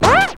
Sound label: SQUEAK; Zero-G; Funky Elements